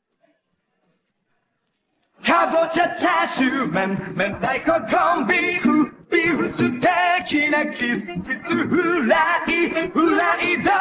vocals

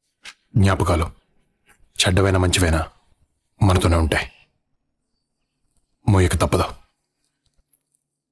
sad vocals

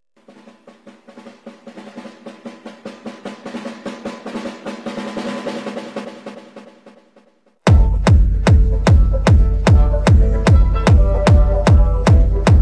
no vocals, 日本古典音乐

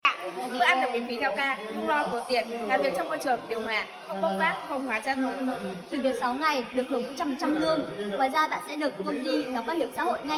vocals

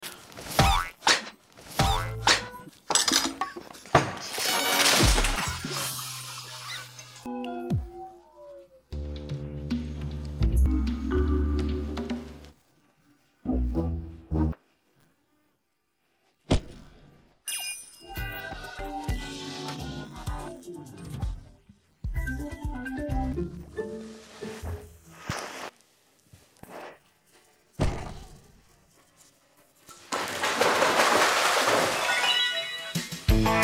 no vocals

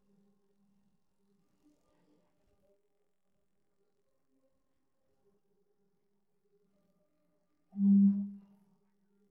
vocals

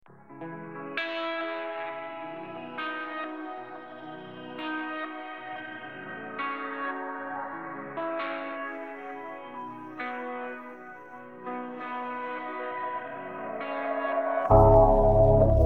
no vocals

